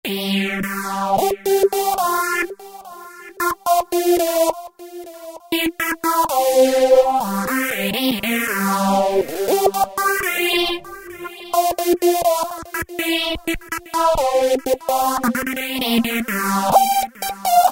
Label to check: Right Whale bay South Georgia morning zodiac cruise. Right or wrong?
Wrong